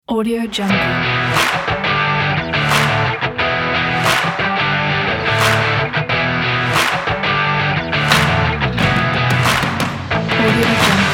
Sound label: sport intro